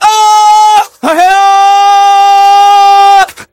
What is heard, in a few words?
scout laugh long